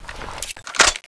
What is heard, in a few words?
sniper reload